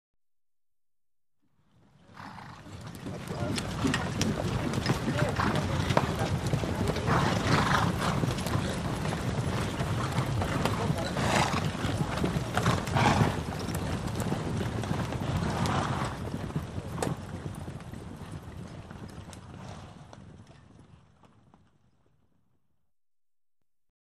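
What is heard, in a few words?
Cavalry Movement, horse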